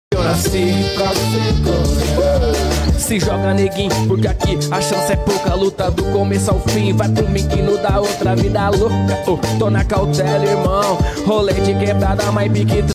hip hop samba live show; boomerang music brazil; sesc

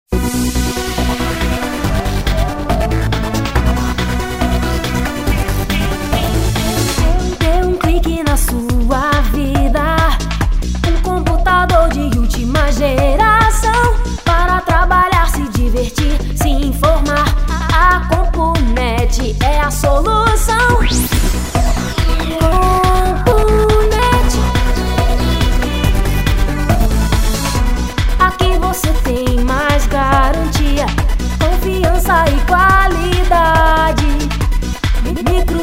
jingles

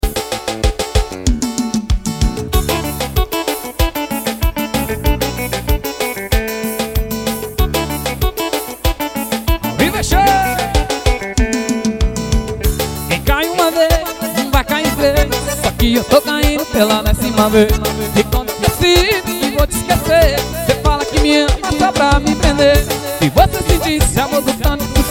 balalaikos do forro

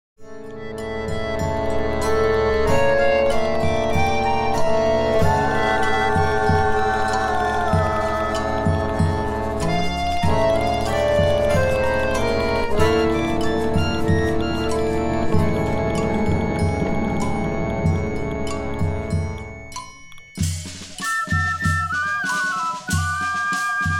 orquestra

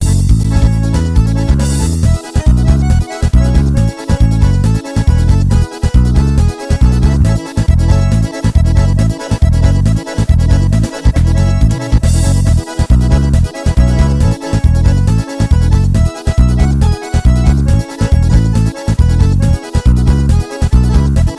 forró

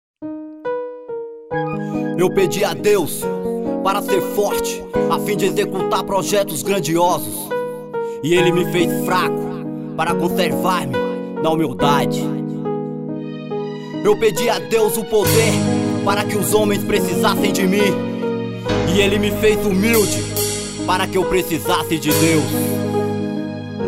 rap intro

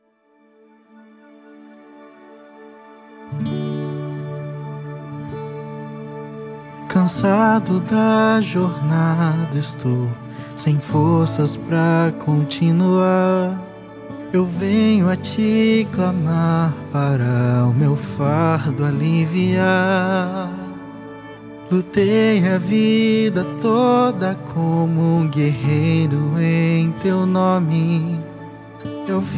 musica religiosa

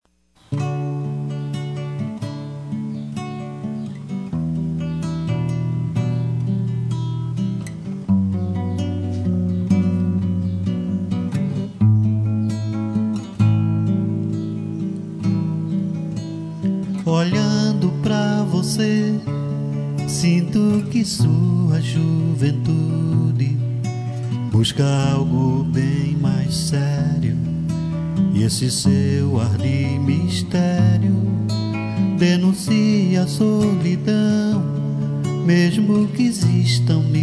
violão voz

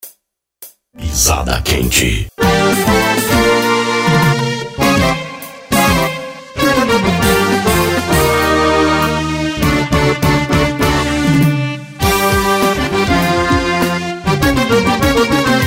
forró, abertura; pizada producoes

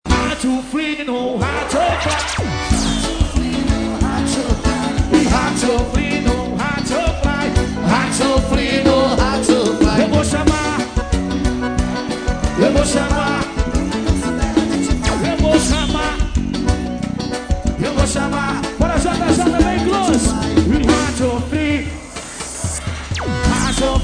forro xote

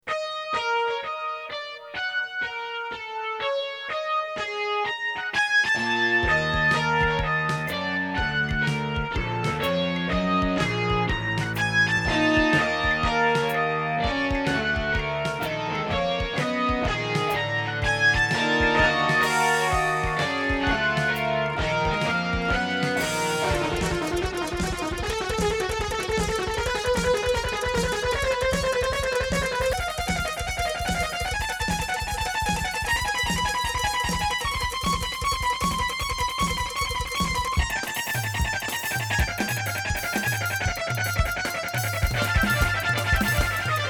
assombro instrumental

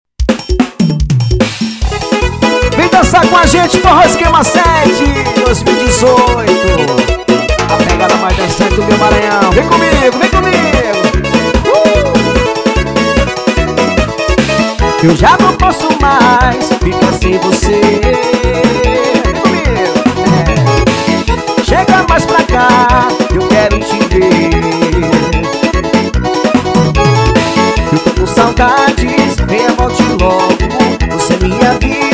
tocando todos os ritmos

